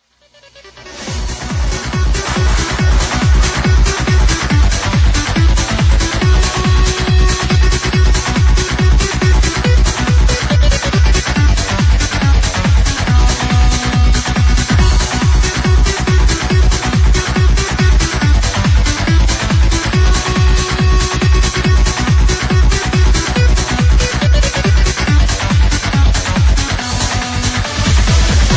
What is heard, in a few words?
trance mix